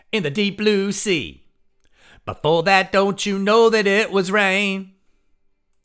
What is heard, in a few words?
vocals